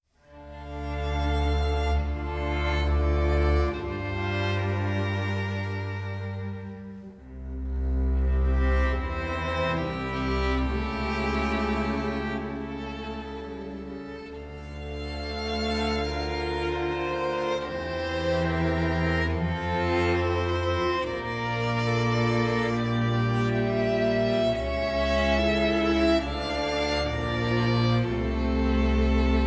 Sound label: String Quintet, Chamber Music